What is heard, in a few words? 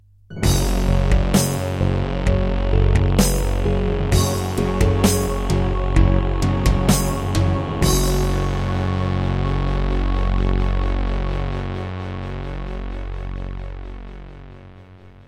Yamaha DB50XG; Doom (Sigil); Introduction